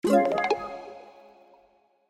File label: win small win; reef raider